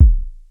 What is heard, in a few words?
bass drum sound b key